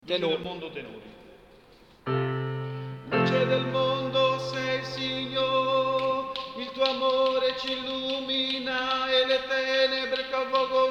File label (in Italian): Luce del mondo (ten); canti; registrazioni